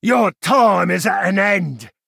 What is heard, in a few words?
knight attack; greenheart